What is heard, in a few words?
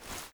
grenade ready; weapons